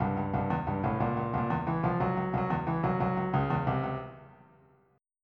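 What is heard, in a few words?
shuffle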